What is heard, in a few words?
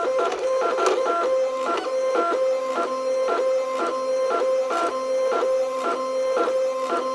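computer talk; ambience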